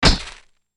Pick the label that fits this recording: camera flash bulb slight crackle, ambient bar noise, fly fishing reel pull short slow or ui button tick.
camera flash bulb slight crackle